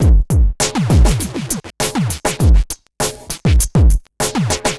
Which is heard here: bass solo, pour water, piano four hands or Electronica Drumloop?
Electronica Drumloop